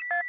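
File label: list click, gui; PC Gamer IT CD 41; machines demo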